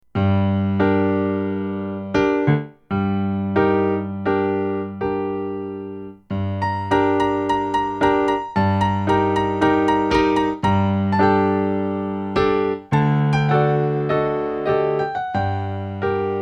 stucke fur klavier; ii zwergenreigen

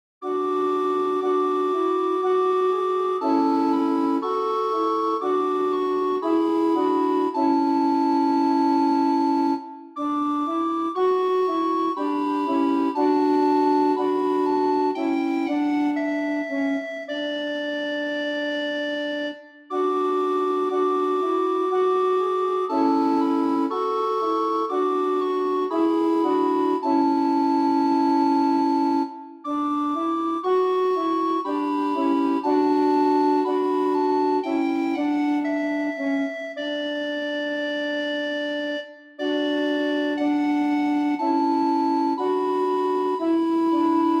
choral; luther martin; ein feste burg

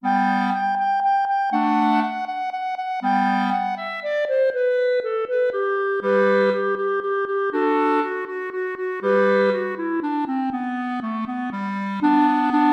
alter ungarischer tanz

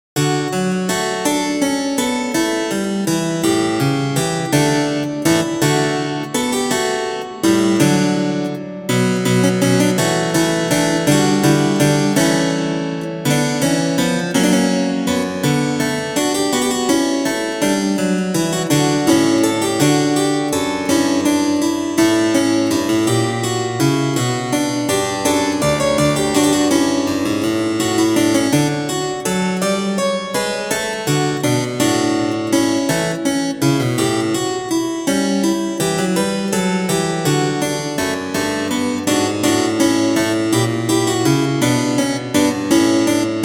kontrapunktstudie; die schlittenfahrt

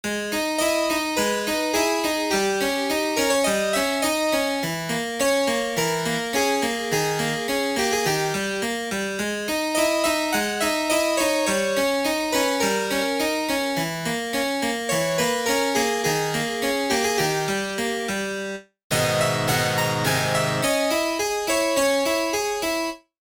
clavier gis moll

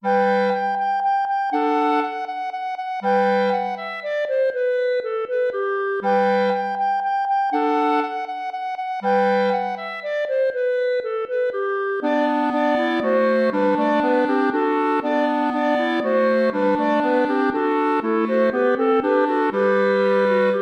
alter ungarischer tanz

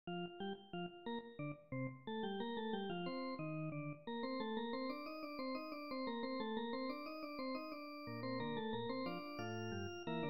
fuge orgel bearbeitet; bach johann sebastian; aus bwv 866

